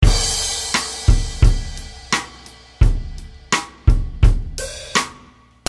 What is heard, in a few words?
Country